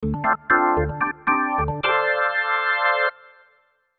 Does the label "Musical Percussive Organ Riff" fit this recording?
Yes